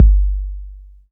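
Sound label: TR808 long bass; Soundengine - CSR-000 The Art of Transwaves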